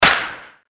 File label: Baseball Big Hit (Homerun); LG GD900 Crystal; Game Sound